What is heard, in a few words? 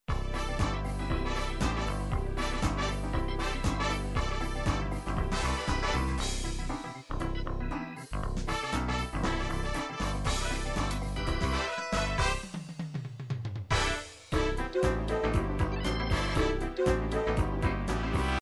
disco soul, midi